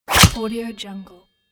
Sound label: sword chop with blade ring